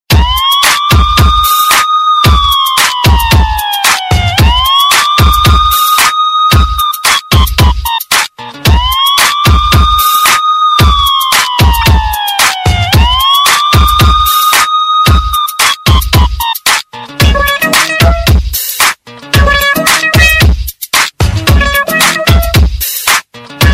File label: Police Siren Remix Ringtone